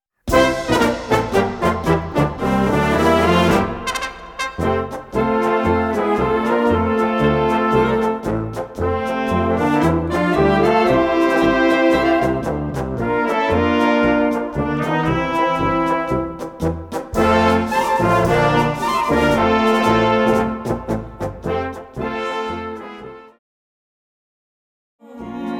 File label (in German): Blasmusik